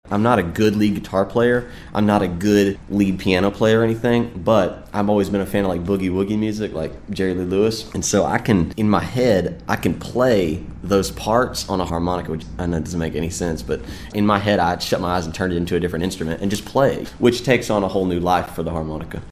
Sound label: Harmonica